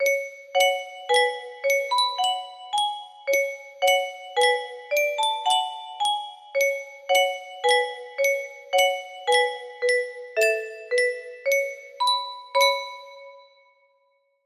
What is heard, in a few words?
calm music box